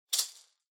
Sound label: Maracas Single